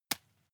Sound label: juggle catch soft